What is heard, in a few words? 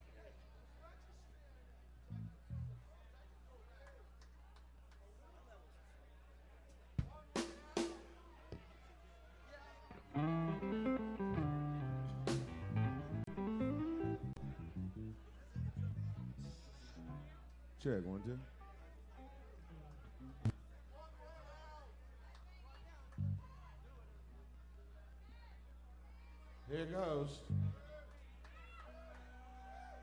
tuning